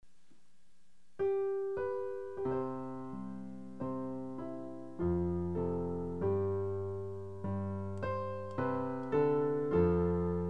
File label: voice